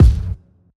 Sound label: short reverb kick drum sound b key, kick single hit tuned to the B note; kick drum samples